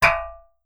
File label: hitsound; GoakeFlax